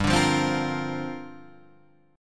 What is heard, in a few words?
G Minor 7th Add 11th; Gm7add11 strummed; JGuitar